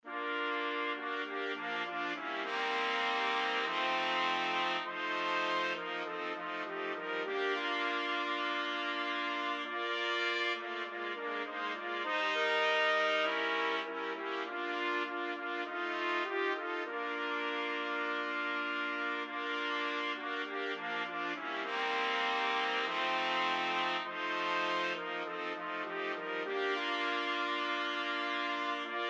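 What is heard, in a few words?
4 trumpets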